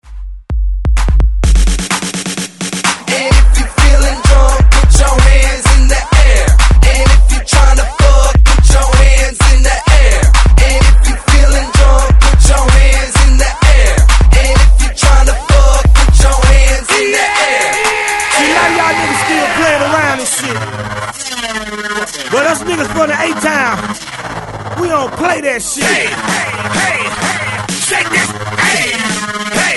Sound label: Hype Mashup 128 Bpm